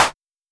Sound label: Simmons Clap